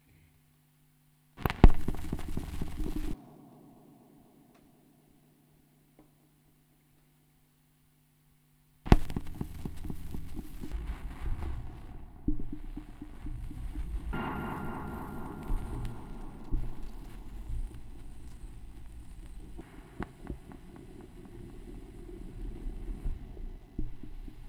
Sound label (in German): schallplattengeraeusche, Tape Echo Mix